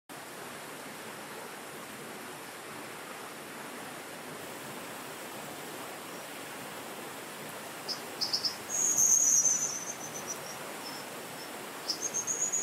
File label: Dippers, زیرآبروک